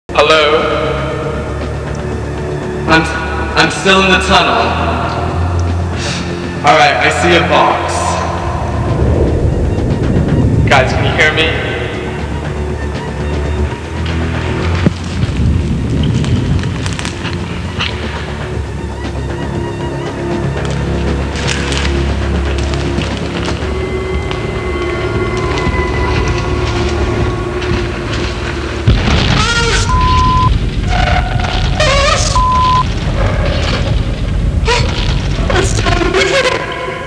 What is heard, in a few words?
chien scream